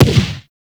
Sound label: Shrapnel Shotgun Fire; Warframe; Railjack; RailJack Weapons